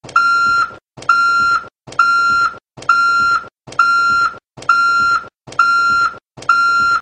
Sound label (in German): lkw piep, Rückwärtsgang